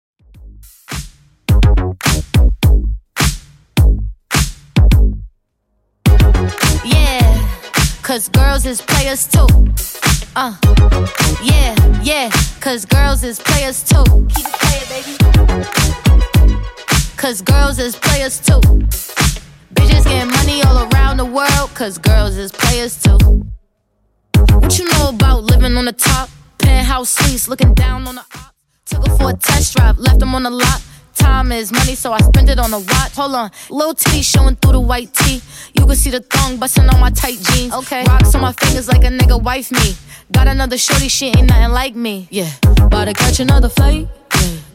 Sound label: Top40, 105bpm